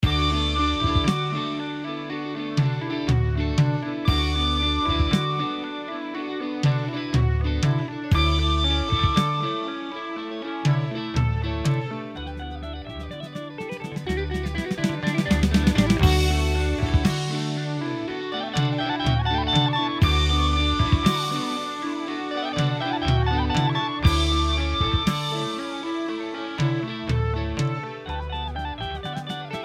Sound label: metronome